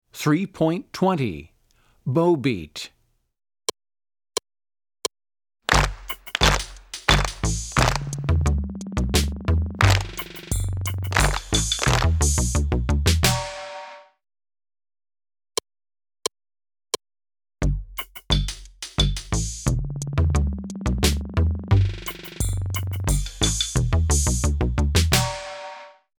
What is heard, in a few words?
Bow Beat